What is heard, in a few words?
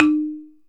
Balafon D#3; Multisamples; Planet Earth; Digital Sound Factory Planet Earth